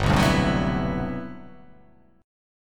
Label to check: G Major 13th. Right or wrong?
Right